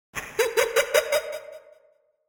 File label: Halloween Quote Laugh; Curly